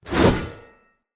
Great Sword Whoosh; Weapons; Swords; Mendicant Great Sword

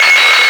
Screen Flicker; Warframe; Duviri; Easter Eggs

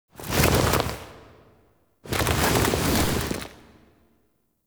Foley; Cinematics; Lotus Gem Part One

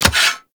Hammer Equip; Warframe; Foley

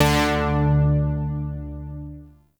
Shawzin Oct One D; Music; Warframe; Zariman

